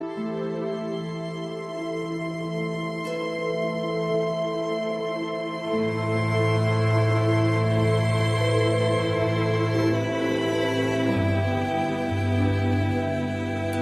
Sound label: loop Sad